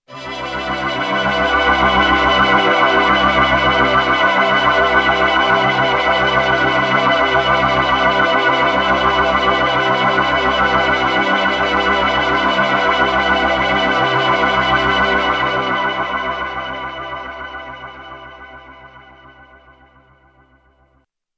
poly pad pigtronix

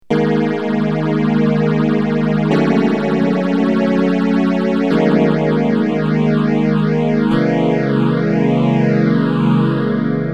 m1000 multivox

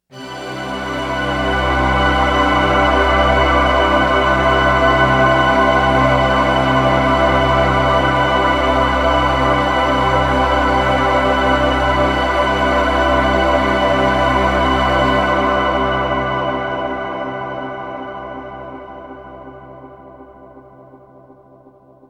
poly pad e1005

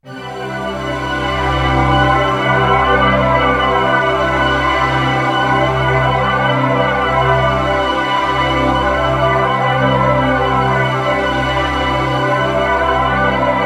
poly pad destination rotation

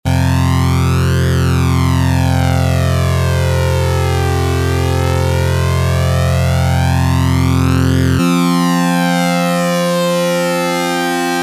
prodigy poly flange

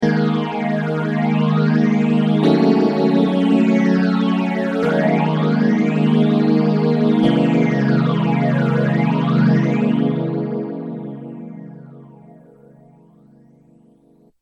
Mutron biphase

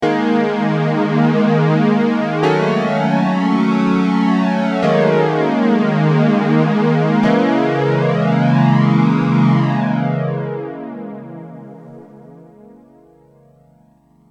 m1000 ibanez fl99

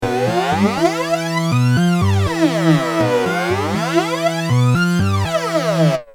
mks50 dod 670

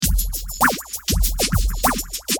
drums phaser

